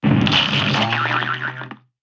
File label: Onion Rise